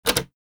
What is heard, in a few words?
button